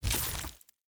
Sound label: gold block destruction stone